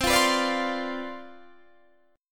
C Major 7th Suspended 2nd Suspended 4th C down Guitar Open D, chord